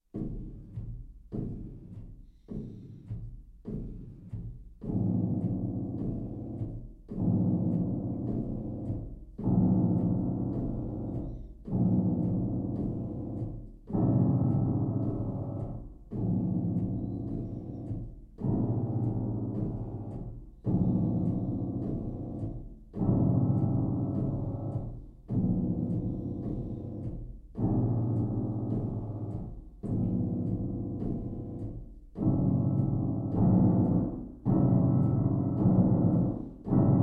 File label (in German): atem puls